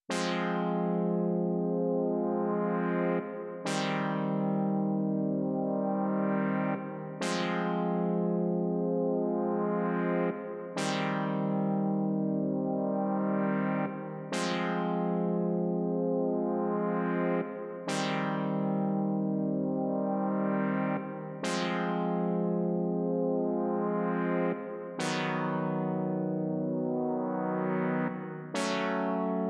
Arctic Oscillation Pads 135; Risk of Rain Returns; Engineer Edition

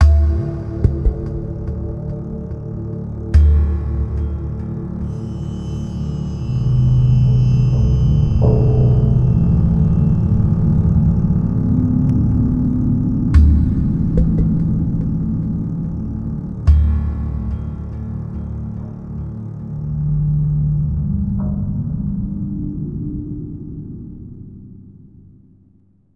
Amb Outdoor; The Hobbit; PS2

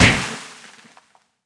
Death Hit; Subway Surfers City; Android, iOS

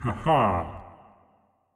Grunt; Marv

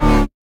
Boss Core Hurt; Avie

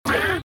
Shakey Kid Roar; The Binding of Isaac

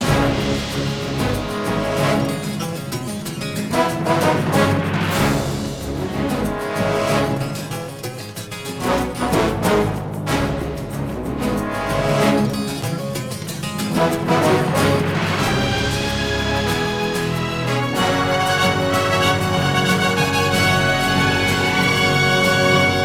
Combat; The Hobbit; PS2

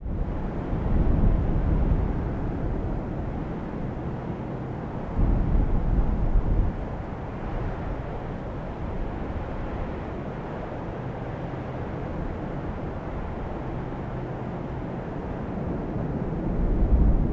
Arche Wind

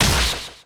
Critical Hit

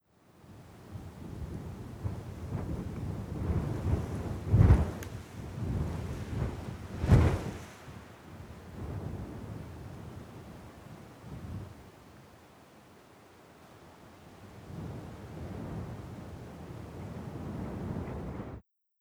Intrepid potash Wind

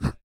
Hurt; Joblin

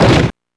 Car

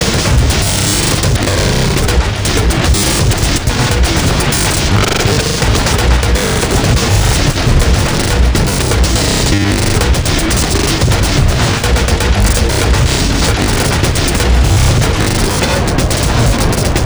DRUMSMASH SFX; Sea of Secrets ~ Beneath the .WAVs

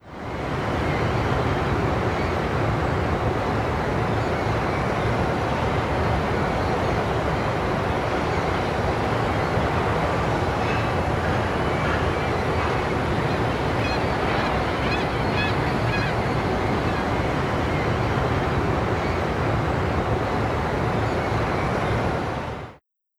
Shipyard

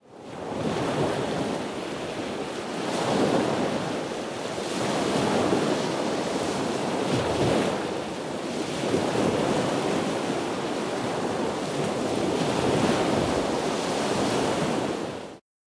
Molokai Ocean

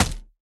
Crossbow Impact; Going Under

